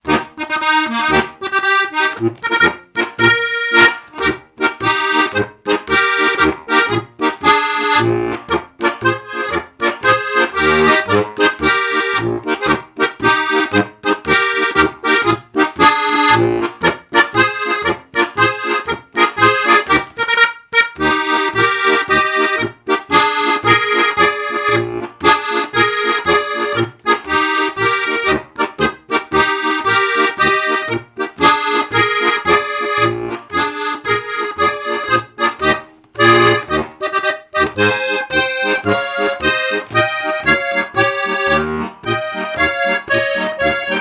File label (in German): steirische Ratespiel, steirische Harmonika